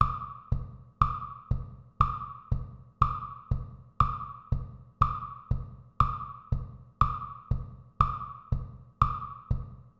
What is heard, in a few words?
two level duple metre dots; organisation of time metre